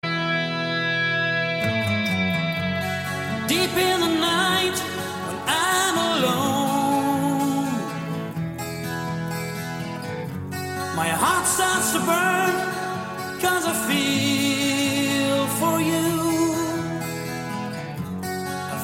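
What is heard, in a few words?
english sad song ringtone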